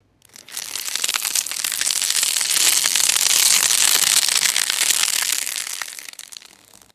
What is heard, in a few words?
pouring dry crispy potato chip shards